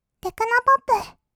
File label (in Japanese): テクノポップ; 1200 kawaii voice!!